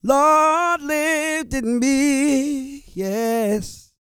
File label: GOSPEL